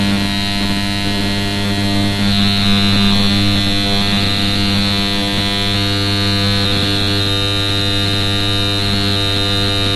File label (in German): US-Detektor Sample US-Bad